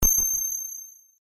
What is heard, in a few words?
UI Rollover Minor, User interface feedback sounds; Computers; Electronics